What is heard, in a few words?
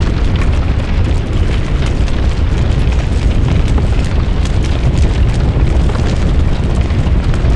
Massive Blaze; spaceship